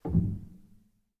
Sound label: rel, Grand Piano; bitKlavier Grand; Piano Bar